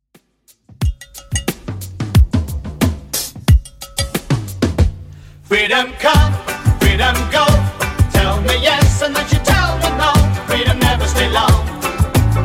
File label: 70s Redrum 90 bpm